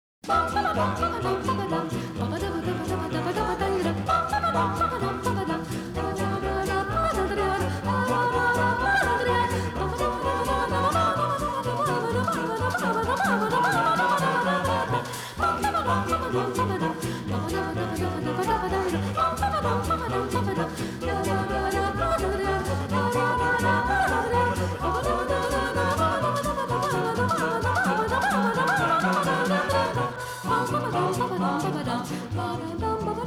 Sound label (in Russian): Badinerie, Российский роговый оркестр; Шутка Баха; Ouverture H-Moll